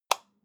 light switch off hard

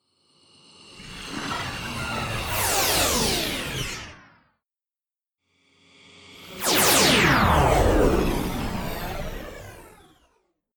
disintegrate

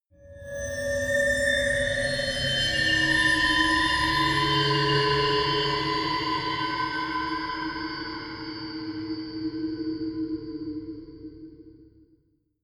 creepy stinger

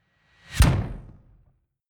incoming kick drum

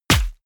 bullet large impact body

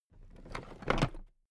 toolbox raise regular

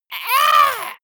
monster painful cry high

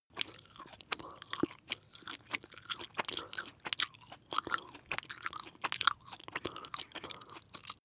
chew jellybaby smack male

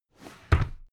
drawer cabinet carton close heavy halfway hectic

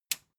metal button tiny push disable

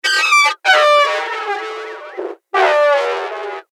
creatures imaginative seq

